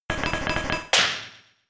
Icicle Spear 1hit; attack moves; Pokemon; Gen 4; DPPL HG SS

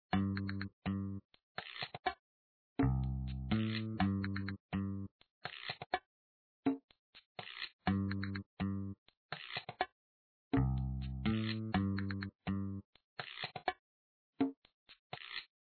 order screen music; papa s freezeria gamerip

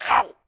bird collision low; Angry Birds Seasons

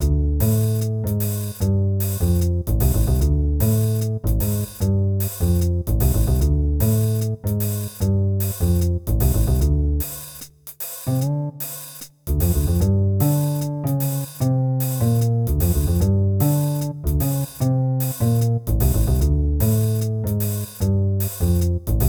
Jazz

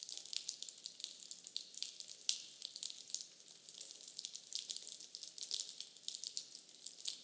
Ambience waterleak; adams venture origins

